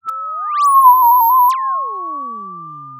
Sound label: Sound of a police car siren